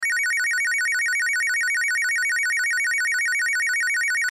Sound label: Hand Held Video, Hand Held Video Game Victory Chime; Technology; Noise; Electronics